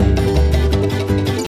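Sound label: ocean world music cage forcefield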